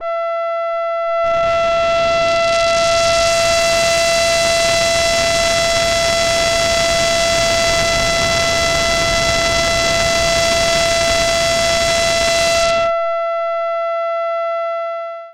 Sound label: Erica Fusion Plasma Drive Sine Dry Wet 50 Voltage Sweep